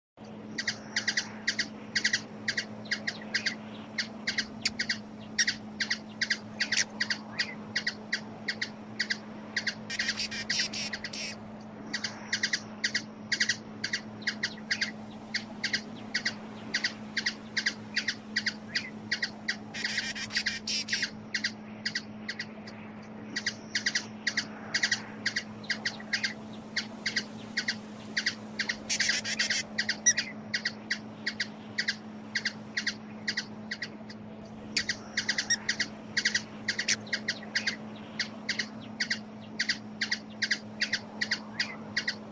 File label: hummingbird sounds hummingbird Calls Bird sounds Bird calls